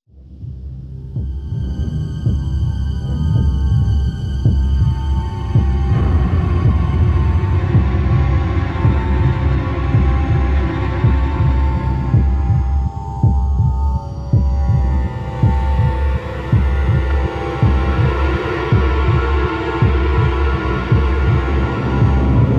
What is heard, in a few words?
ambience